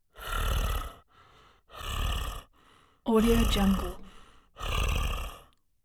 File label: dragon breathing growling breathing; human sounds